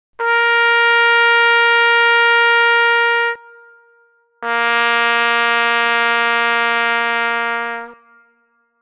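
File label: signals, hang up; otboi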